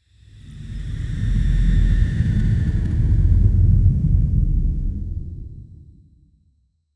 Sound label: slow motion dragon wing f, bass rumble